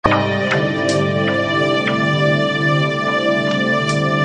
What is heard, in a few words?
melody